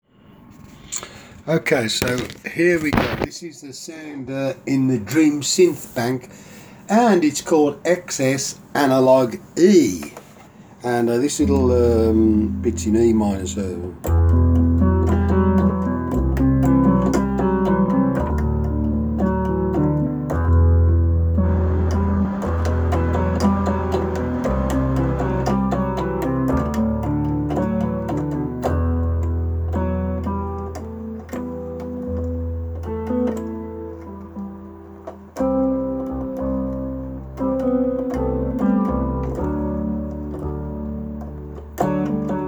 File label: analog E (dream synths); Diva XS